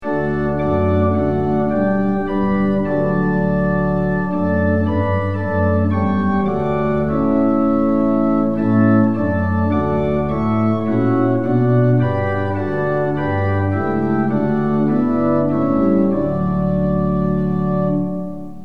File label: tune; hymns; lillenas